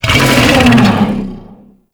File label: Infested Bone Blade Vocal Soft; Warframe